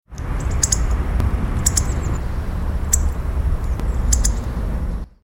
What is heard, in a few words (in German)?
kernbeisser